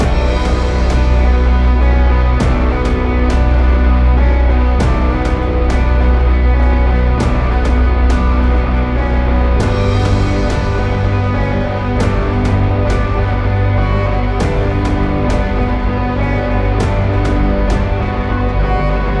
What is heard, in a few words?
Darkest Loop; Face It - A Game to Fight Inner Demons